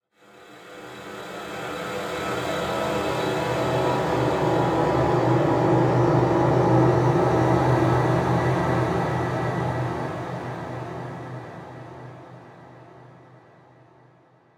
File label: Sinister Moment, Cinematic Ambiences; Zero-G; Alien Skies - Cinematic Ambiences 2